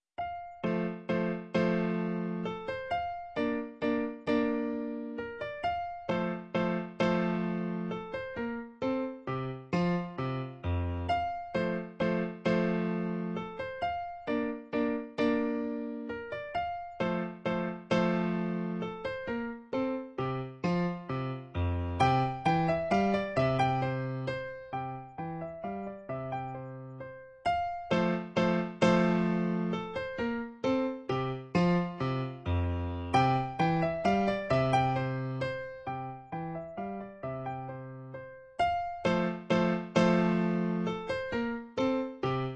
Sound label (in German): Menuett F; Mozart